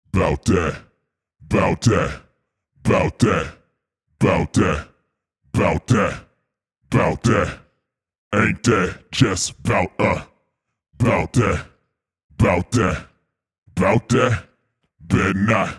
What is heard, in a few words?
Pitched Vocals